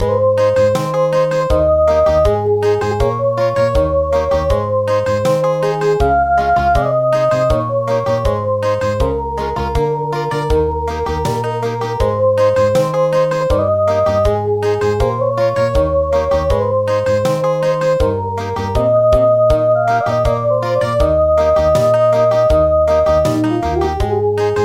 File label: Country, midi